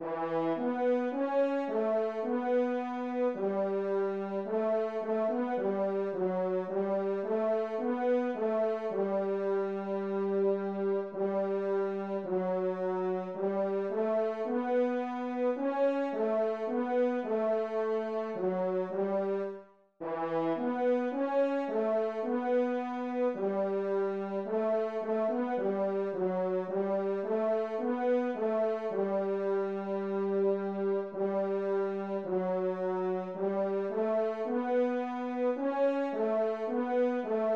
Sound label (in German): tenor